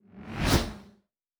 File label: Fly By, Sci-Fi Sounds Movement